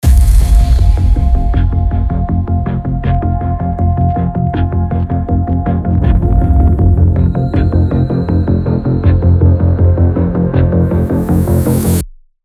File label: Arena, Suspense & Action Bgm; suspense action bgm pack